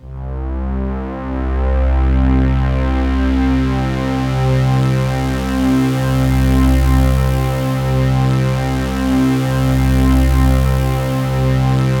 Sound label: PAD6 C3; USB Soundscan vol.05 - Explosive Jungle; AKAI